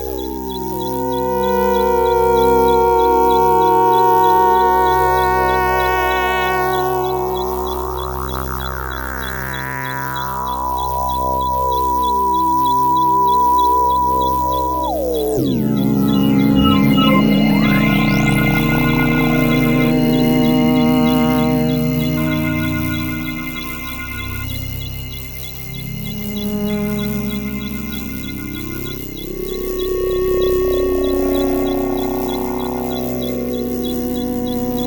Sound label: sci fi; Sonic Foundry (Sony Creative Software); Ugly Remnants VOL-2; UR 2 FX (Long)